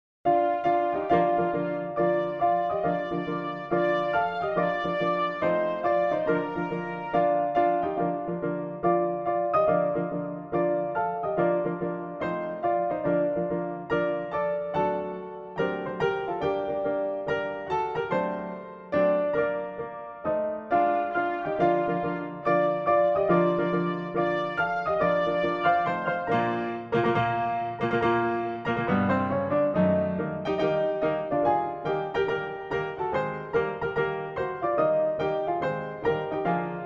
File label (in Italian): Inno Italiano al presto piano